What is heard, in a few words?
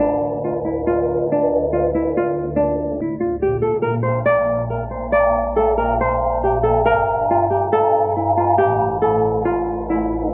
guitar, Sad